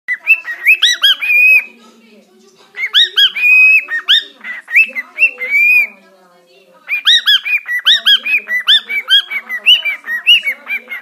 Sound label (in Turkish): sultan papagan